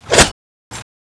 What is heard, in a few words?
draw, knife